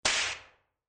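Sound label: strong slap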